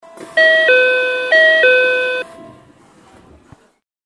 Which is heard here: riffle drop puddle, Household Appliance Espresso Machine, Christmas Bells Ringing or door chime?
door chime